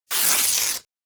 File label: Paper rip